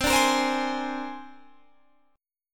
C 7th Suspended 2nd Sharp 5th, C, down, Guitar, Standard; strummed; chord; C7sus2#5